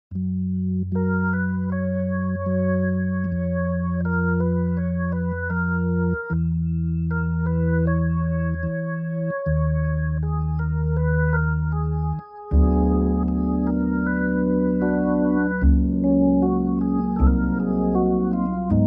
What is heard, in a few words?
organ; Organ Trio; key - C - vocal range - C to D (optional E); Organ Trio arrangement